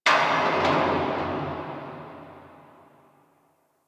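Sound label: Distant Metal Garbage Wronk; Warframe; Ambience; Nineteen Ninety Nine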